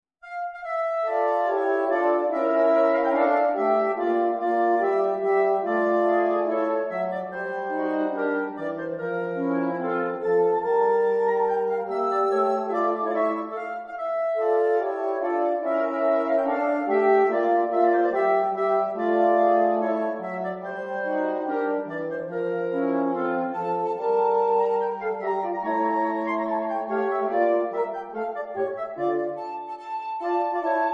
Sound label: Quartet; Catel Quartet